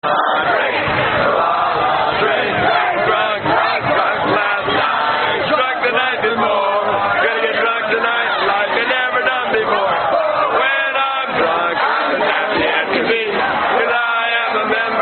Drinking Song; Cal Drinking Song Big Game 2009; God Damned Dutch (California Drinking Song)

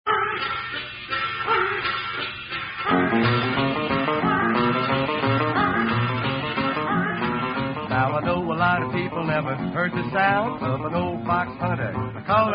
Kentucky Fox Chase; american roadsongs

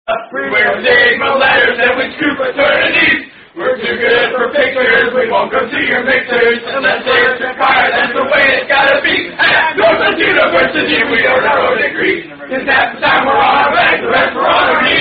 Fraternity Song; Unknown TKE Fraternity Song

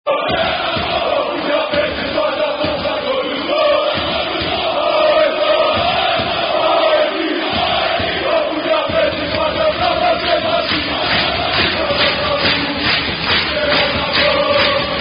Footie Chant, Footbal Chant; Aalesund

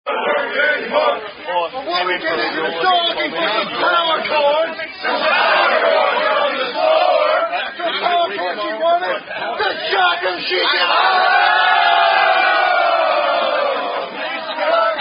Rugby Drinking Songs; I Used To Work In Chicago

